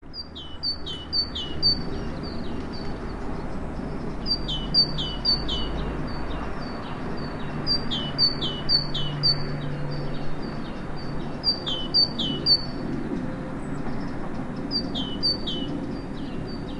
mayskaya pereklichka u skvorechnika, zvuki sinitsyi

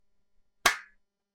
hlopushka i tishina

